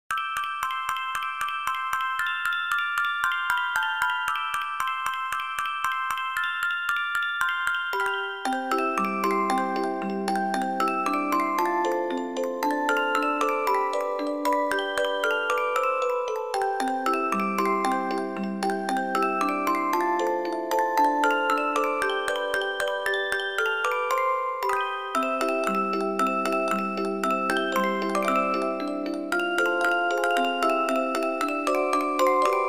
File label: jingle bells, xmas